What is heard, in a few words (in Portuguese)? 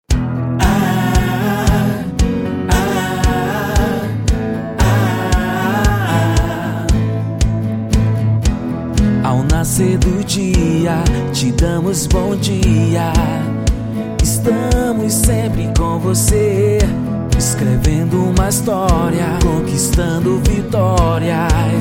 jingle tv tapajos 35 anos